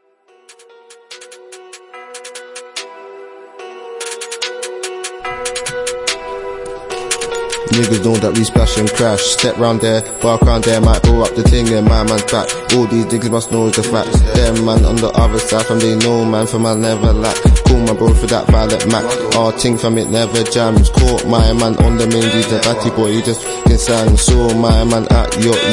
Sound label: Rap